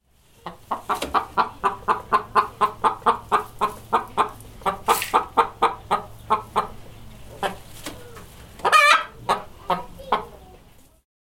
DYR Høne

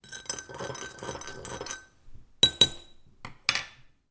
KØKKEN Røre rundt i kop

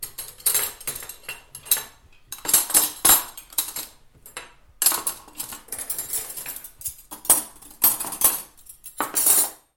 KØKKEN Bestik

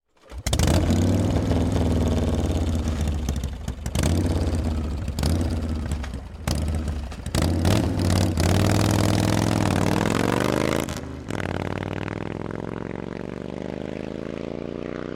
KØRETØJER Motorcykel tung starter koere vaek

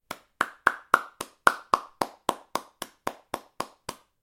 Klappe; BEVÆGELSE

